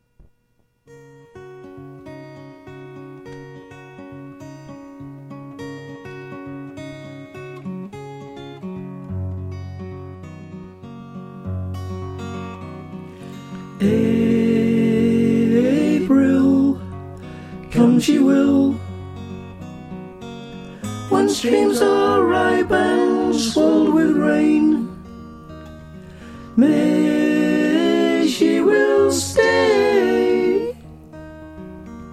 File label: Mezzo; Choir Harmonies